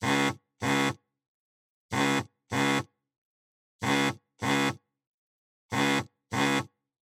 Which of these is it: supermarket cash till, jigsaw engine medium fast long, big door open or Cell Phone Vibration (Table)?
Cell Phone Vibration (Table)